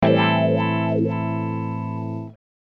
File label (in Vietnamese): Am thanh chuyen canh tieng guitar dien